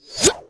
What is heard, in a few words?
swordman attack; megaglest